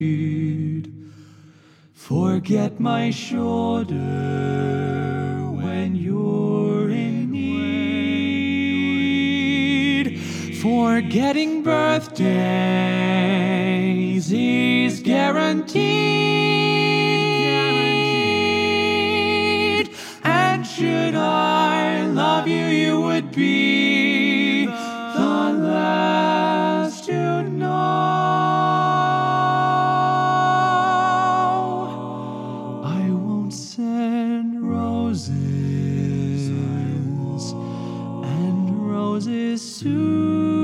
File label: Lead